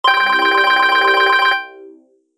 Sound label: MELODY